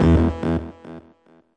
bendy resonance synth

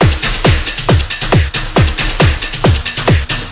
137 ordinary electrobang, drum loops and breaks

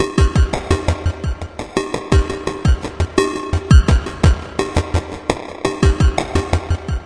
136 reverb, drum loops; 131 to 140 bpm

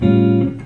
mellow jazz guitar

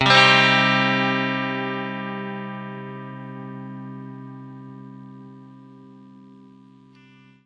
clean chord Cm, clean electric guitar chords

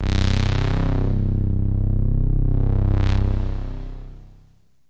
Sweep, Pads

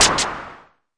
mbrute noiz, Arturia MiniBrute

